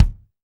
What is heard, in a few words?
BD, DR BD; Best Service Gigapack 2